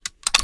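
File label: sniper reload fast load; gmod; viper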